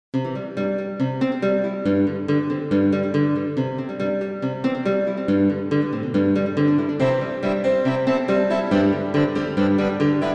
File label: Strumbly Beeps; Tumbly